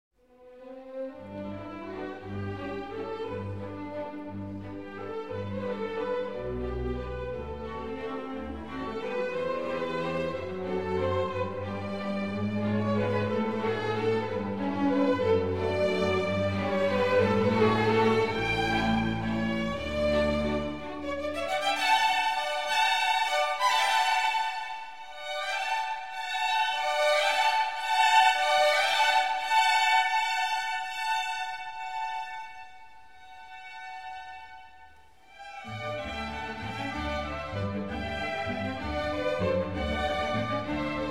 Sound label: Serenade For Strings in C Major, Walzer